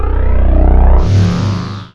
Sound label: holdable